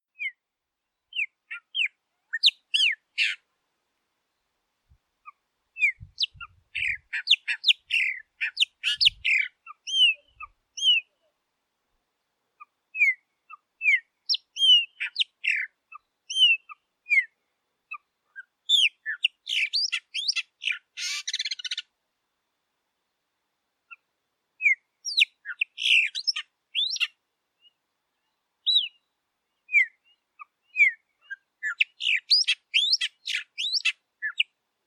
Canto do Pássaro Preto

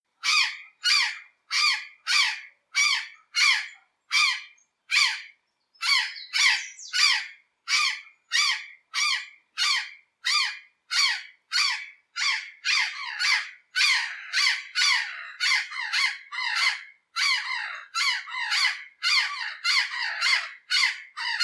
Canto da Gralha Azul

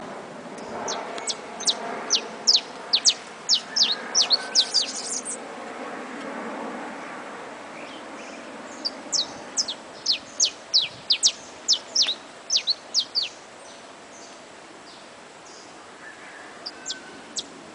Canto do Canario da Terra

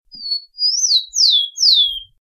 Canto Tico Tico; Viviuteltel

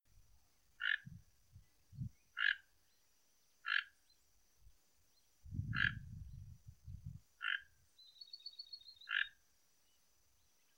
Canto do Tucano Toco

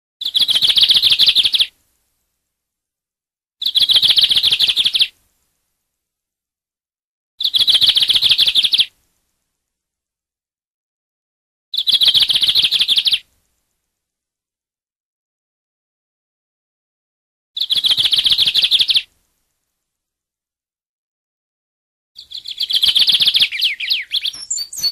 Canto do Bigodinho

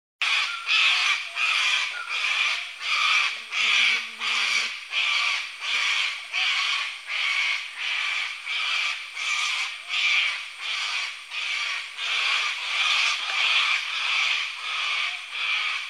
Canto da cacatua de crista amarela

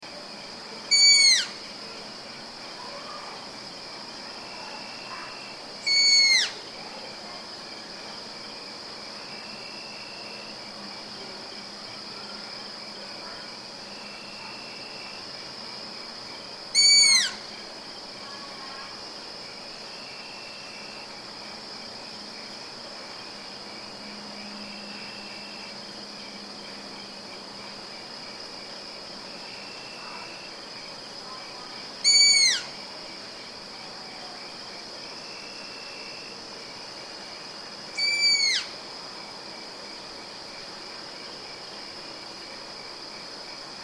Som Coruja orelhuda

